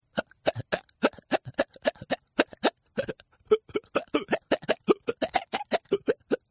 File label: Hiccup Attack; Hiccupping; Human; Partners In Rhyme